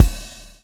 swing

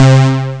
bassline; Amiga Soundtracker Sample Packs

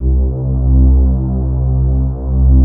TERRA PADS; Pro-Rec - Synthsonic

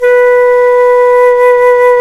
FLT C FLT, C Flt Brt

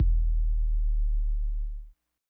bass tone; Big Fish Audio; Platinum Essentials

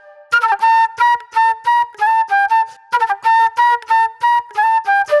J-Funk Flute, Call And Response

